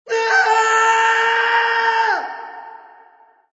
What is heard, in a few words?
scream